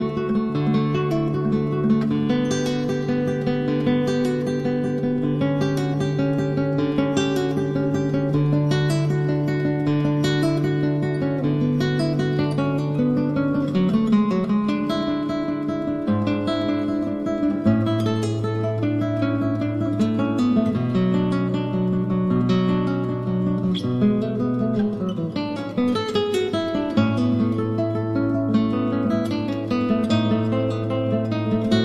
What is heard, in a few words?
Cello Suite No. 1- Prelude